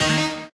basic Win Line; mega joker

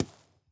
count Up; dead or alive 2

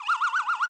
Hat Spin; eldorado

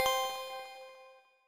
count up end; joker pro

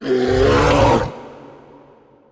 win Bear; wolfcub

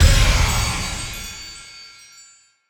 text change; druids dream

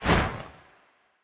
random Wild Whoosh; bloodsuckers2

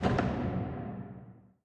reel Bounce; godsoffortune

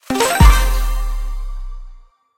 medium win; vegas night life

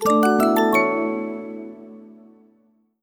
free spin anim sound; fruitshopchristmas

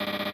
Robot Dance; eldorado

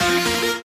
basic Win Line; mega joker

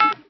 Spin Button; knight rider

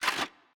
spin Button Down; cashomatic

